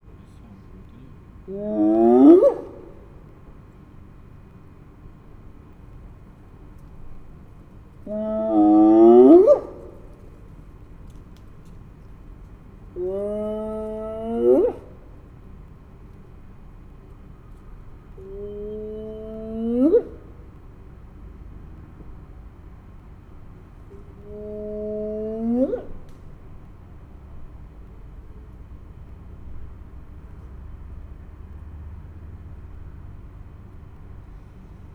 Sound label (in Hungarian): hím állat, foltos hiéna